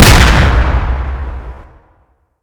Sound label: c4 splode close